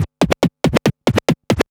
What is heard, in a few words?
Scratch Fills 140BPM